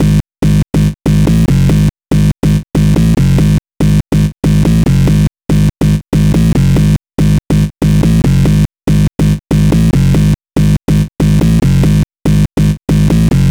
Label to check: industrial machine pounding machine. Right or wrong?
Wrong